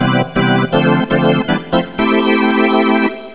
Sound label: GAMESHOW